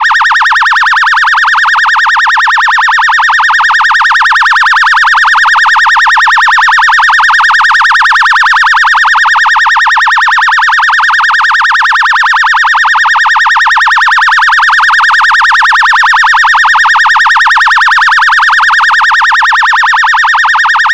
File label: electronic phaser siren